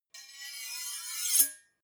two knives large scrape

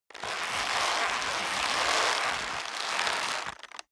leather creak long hard

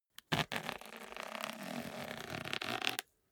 zipper small case open slow

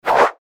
fight swish back

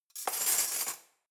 cutlery knives drawer place

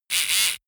servo small buzz fluctuate

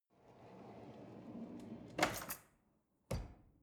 drawer cutlery close draw

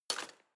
knife wood drawer place

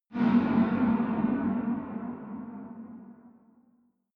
tunnel horror

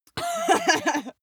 female laugh short

